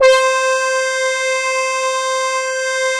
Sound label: ARP, Analog Fantasies; BIG RASS; USB Soundscan vol.49; AKAI